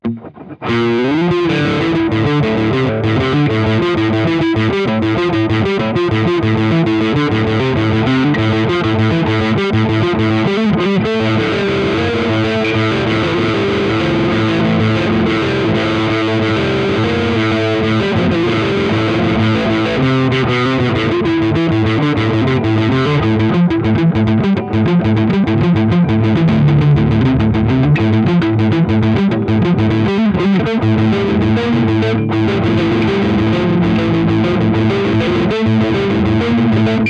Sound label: bells